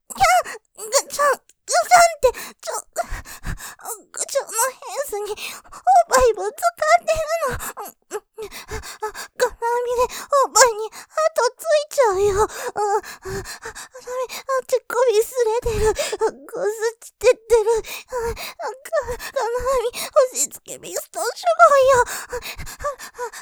喘ぎ フェンスにぶつかる金網ピストン・乳首こすれちゃう; モノラル; ループで楽しむ！シェフの気まぐれ抜き抜きコース

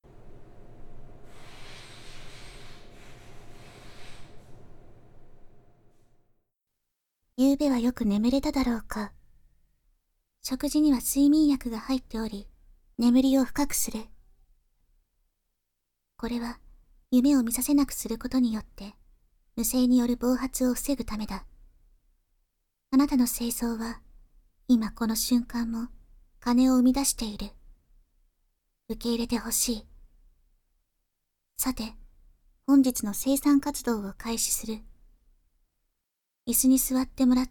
耳舐め 効果音あり; 生産工程2

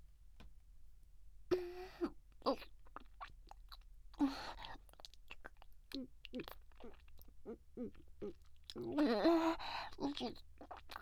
唾液を交換し合う絡み合うディープキス, ASMR

